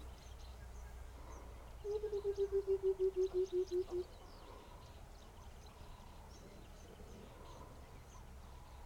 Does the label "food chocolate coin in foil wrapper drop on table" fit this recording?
No